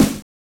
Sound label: Snare Better, Genesis; Sonic 3; M8